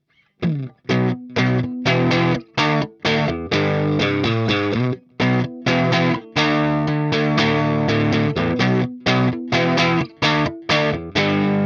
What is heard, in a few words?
duosonic crunch; squier; brunetti